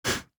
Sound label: deer, animals; GGJ22